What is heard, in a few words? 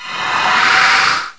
Cursed King Die